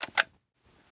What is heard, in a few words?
mp5 clip out; Rust